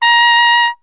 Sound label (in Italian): tromba